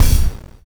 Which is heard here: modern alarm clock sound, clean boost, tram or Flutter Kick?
Flutter Kick